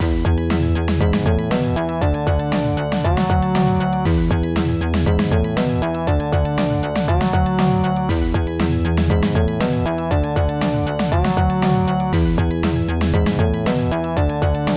Country; viper v8 turbo rs fm towns 1995

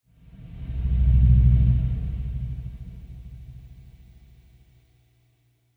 Marimba and Drums Mood

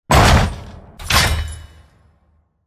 Castle door Slam; Level16

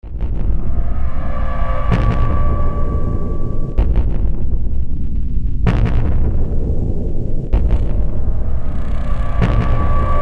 Music Ambient; Blowout; GC; PS2; Xbox

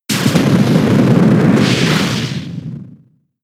Lava Plume; pokemon sfx gen 7 attack moves sumo usum

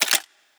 Weapon Foley

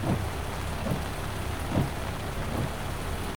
Background Car; Ghost 1.0

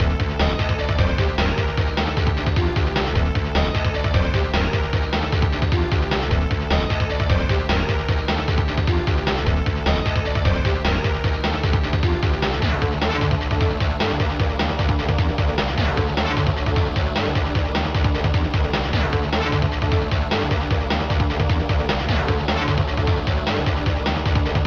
Boss Fight; F-Zone for Fury3; Belazure

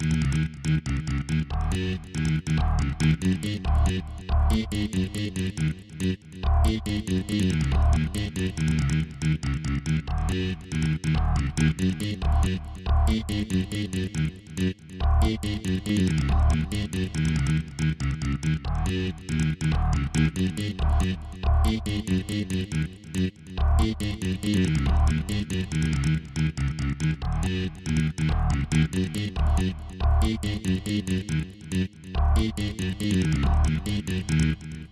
Techno Bass

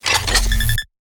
Sci-Fi Weapons-Loading Fx

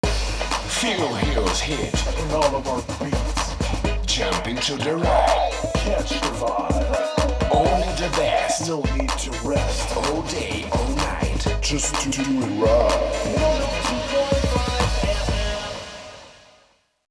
Radio Car Hiphop Jingle; gangstar rio city of saints

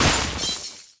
Ice; The Denpa Men; Android

